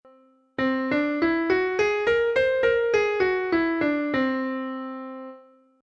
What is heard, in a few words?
C Whole Tone